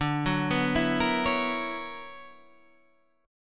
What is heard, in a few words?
B Minor Major 11th D, chord